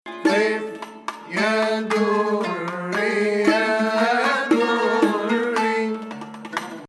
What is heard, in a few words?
Hijazkar Octave